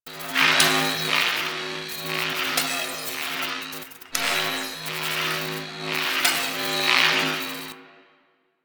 Glowing Egg Small Loop; Props; Warframe; Entrati

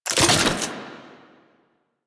Alchemy Grenade Drop; Warframe; Entrati

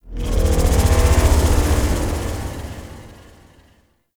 Forcefield Alarm, traps; Objects

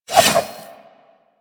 Nikana Spin Swing C; Revenant

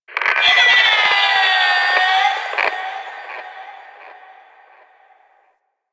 Vomvalyst Lure Song; Warframe; Teralyst

